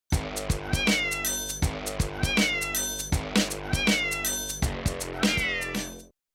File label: meow; adult swim; meow mix bumper